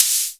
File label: Hi Hat Dirty; Electric Empire